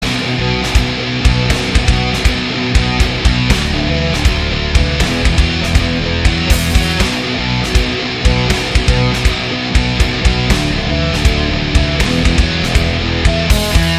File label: tone test, progressive metal djent